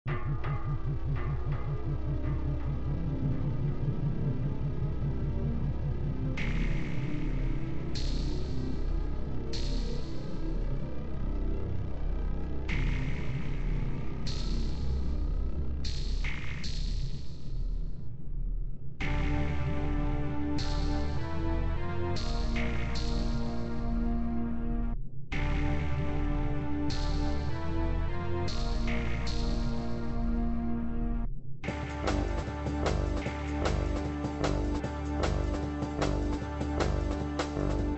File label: phaser